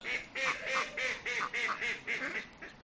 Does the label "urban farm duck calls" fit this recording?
Yes